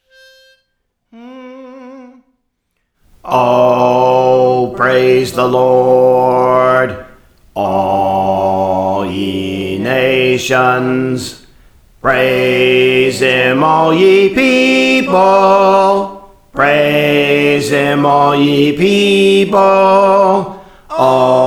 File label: Bass, Songs; Praise For The Lord Training